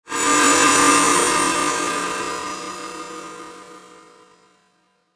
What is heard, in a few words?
Metallic Distortion Decay; Metallic Sound; Metallic Sound Sound Effects; Production Element Imaging Element Accent Transition